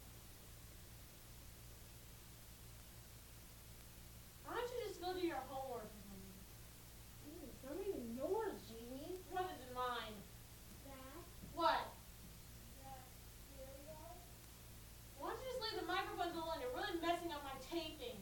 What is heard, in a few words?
screaming not to mess with my taping